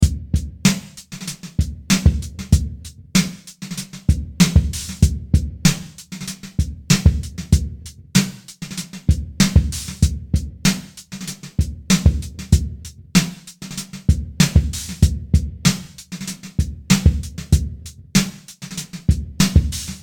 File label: Designer Drums